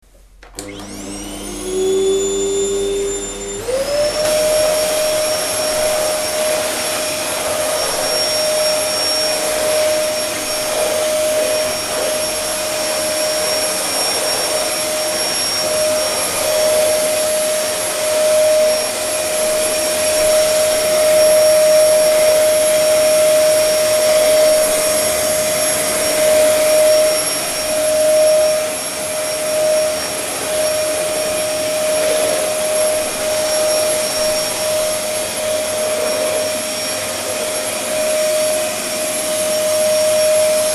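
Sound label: vacuum cleaner sounds; zvuki pylesosa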